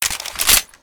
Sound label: p228 clip in; weapons; kuilu